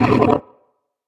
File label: monster roar short; soundscrate